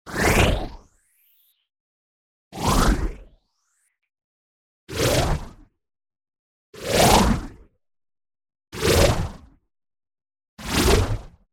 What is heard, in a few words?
WHOOSH Noise Bubble Down; boom library; Anime Essentials